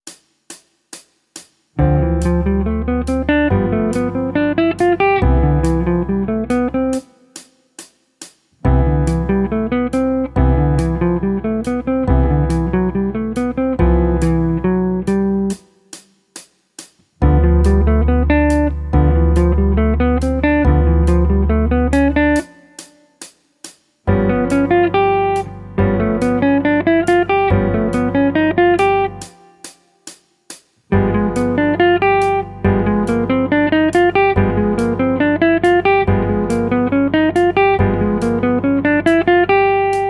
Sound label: Jazz, Guitar